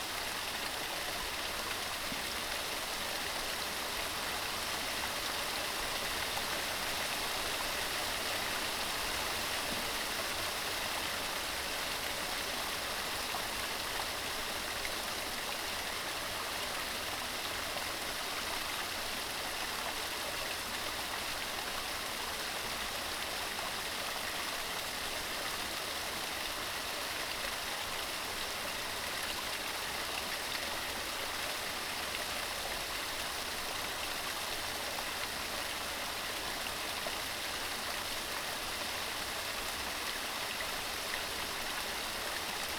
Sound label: waterfall small; inspectorj